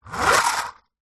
leisure toy lego car push wood lift wheels spin air